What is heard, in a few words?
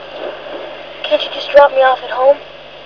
drop home